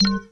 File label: ERROR; MioMap C220; Rabbit-Wars